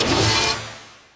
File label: Movepaw; Super Mombo Quest